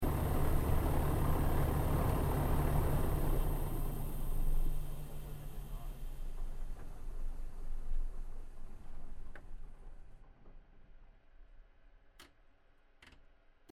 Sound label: aircraft 1941 lockheed hudson shutdown interior stereo